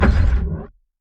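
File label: basic on hit; LoL; fiddlesticks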